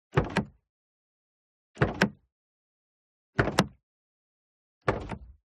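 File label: SPORTS CAR Exterior Door Open Medium; TRANSPORTATION; smartsound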